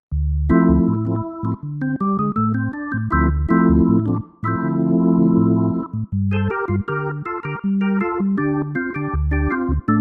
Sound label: Jazz Organs